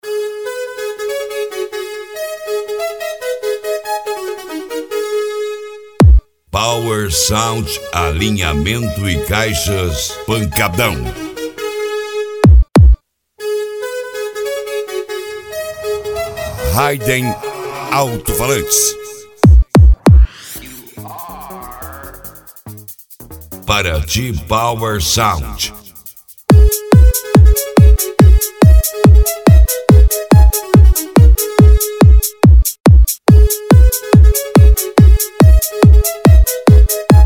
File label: pancadao; Power Sound Alinhamentos e Caixas